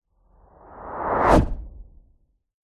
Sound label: sound design cinematic short whoosh with mod delay fast tail; zapsplat